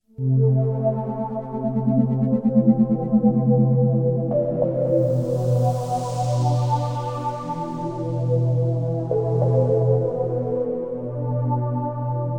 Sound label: ambient; X-Bow Software; 2004 - Star Wolves